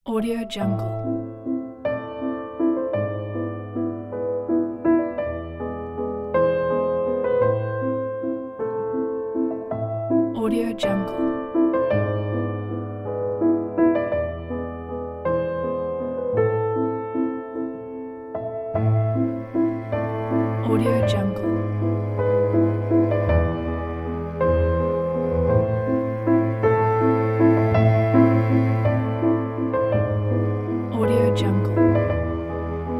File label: background sentimental waltz